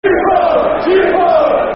Football Chant, Soccer Songs; Gippo; Cheltenham Town Ctfc

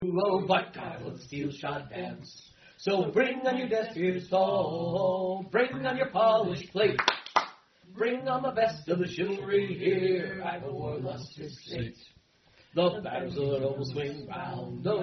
Singing, Steel Shod Dance

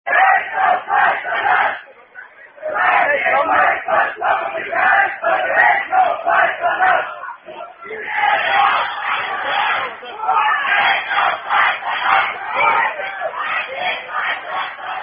Children's Chant; No Flies On Us

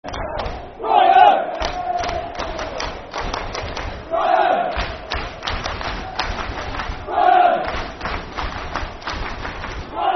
Brighton Clap, Football Chant; Brighton Bhafc

